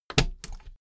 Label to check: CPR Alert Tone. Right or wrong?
Wrong